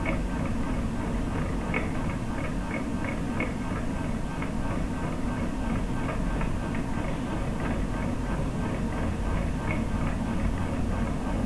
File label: slow fan